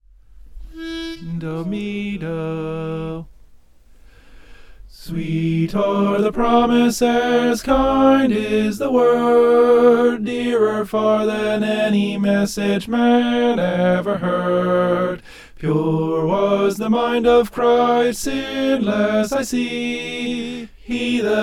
where he leads soprano

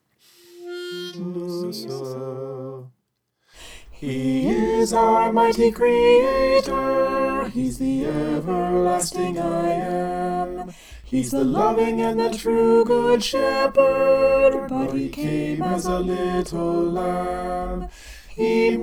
he came as a little lamb soprano

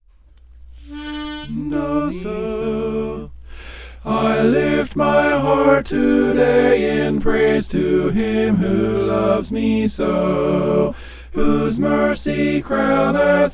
mixed

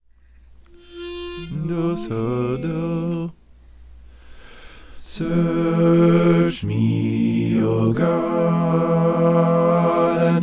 search me o god bass

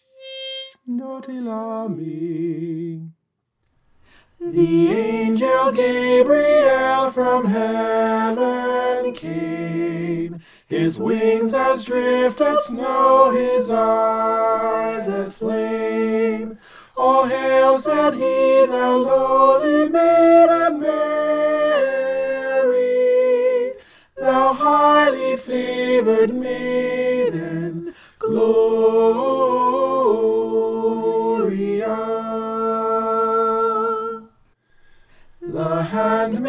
the angel gabriel soprano

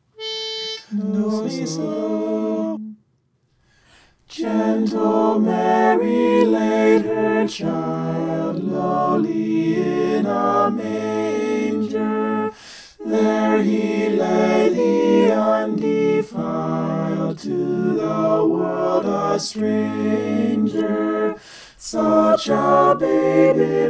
mixed

